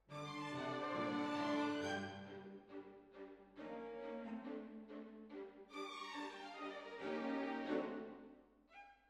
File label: Hall, Jazz for piano